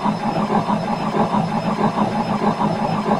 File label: loop machine; sci-fi